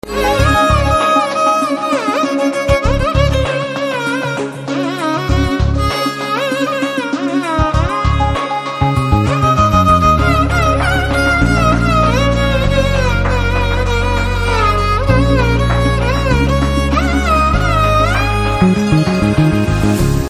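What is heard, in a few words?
background music Telugu Ringtone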